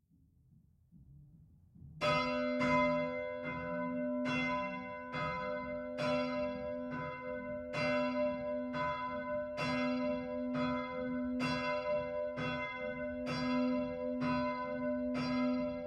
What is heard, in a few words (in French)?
eveil int; campanologie; stpierre